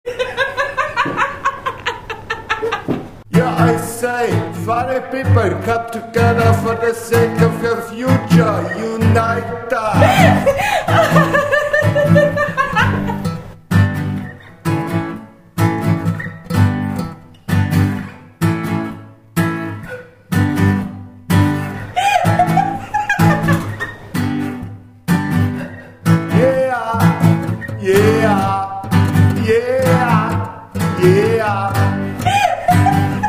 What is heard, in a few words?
REGGAE